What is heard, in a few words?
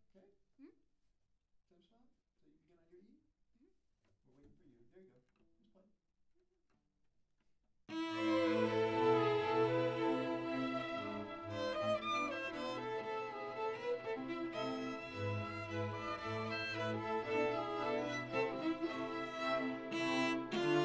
haydn cello concerto 1; haydn cello concerto 1 mvmt1